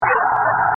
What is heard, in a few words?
spooky saturn doop doop